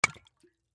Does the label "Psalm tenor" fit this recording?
No